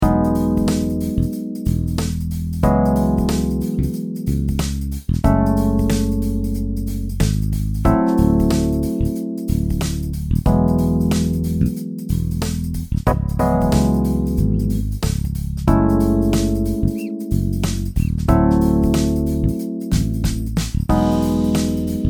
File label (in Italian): solo base